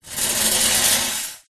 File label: metal basket small scrape concrete; foley; zapsplat